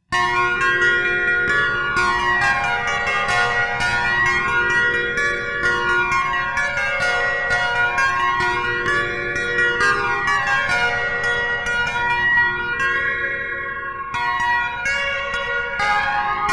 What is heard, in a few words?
Pipa MC Guitar Rev Symph Solo